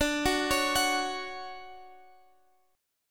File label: D-Major 7th D down Guitar Half Step Down